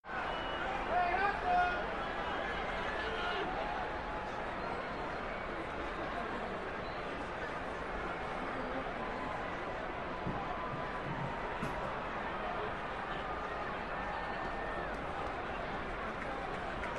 Arena Crowd Idle; sports arena

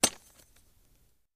Pick Up Sword Grab; swords

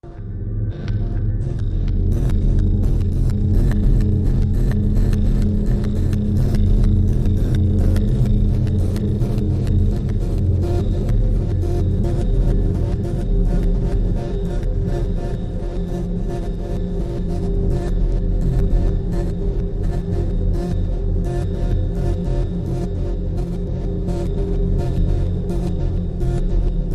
Firm Intent Mid Pulses, sci fi ambience

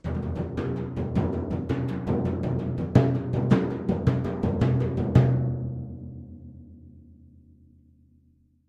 Timpani, (Hands), Accent, Symphon; mallets timpani

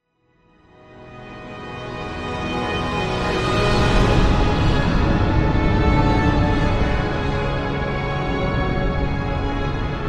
Music Eerie Dramati, orchestral

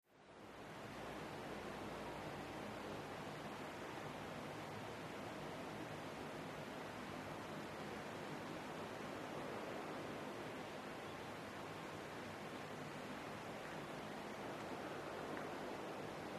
Desolate Wind Mix, sandy desert gusts; Desolate Wind Mix From Nevada Salt Flats

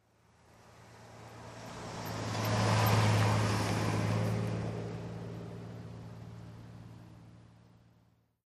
Car By Medium Speed

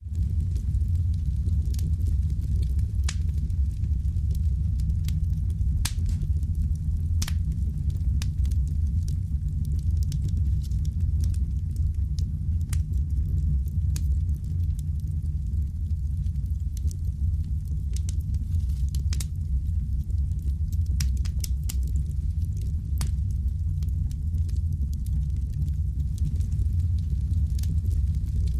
DEEP FIRE BURNING, crackles

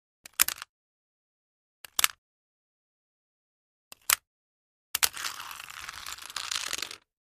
22 Ruger Revolver, foley gun movement

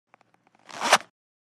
Pants Zip Unzip; zippers

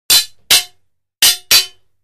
Swords Broad Hits; clangs

